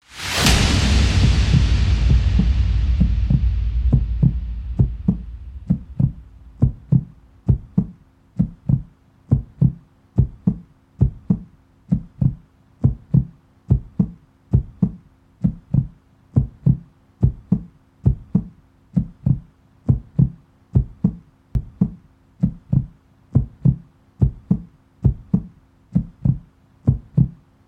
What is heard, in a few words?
ambient horrible sound; story